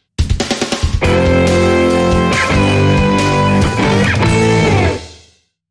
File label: Rock Guitar Theme